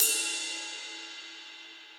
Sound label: Ride Mid Cold Tight Room; Drums; Drum Hits; Standard Hits; Ableton; Session Drums Studio